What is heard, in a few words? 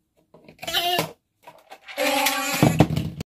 shape sorter fun sound effect; purple owl